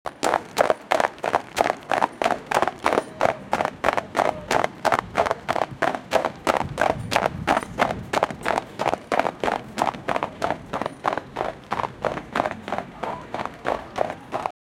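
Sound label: the foot legions of ancient, army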